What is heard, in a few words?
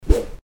jump sound something